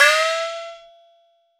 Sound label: Shou Luo; Cymbals